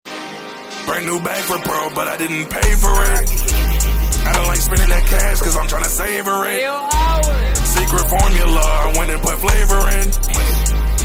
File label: krusty krew song; spongebob; rap